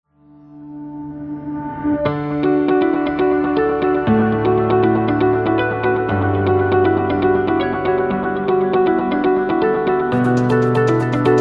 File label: Corporate Fly; corporate music vol 5